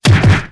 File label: dragon combo, effect